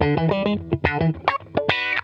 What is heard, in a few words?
Funk Guitar; 90 s Sample CDs; Zero G